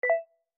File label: touch item; effects